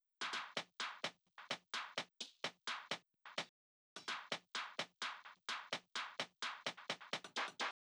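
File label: Drum Loop 128BPM hi-hat Percussion Add; VEC5 Drumloops; VEC5 Multi Loops 128BPM; Loop Elements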